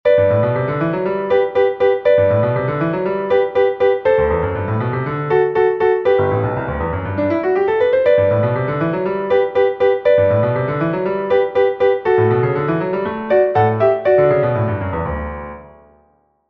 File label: LH Romp - G; Czerny